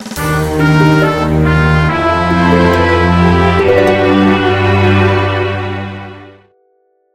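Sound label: FanFare, MIDI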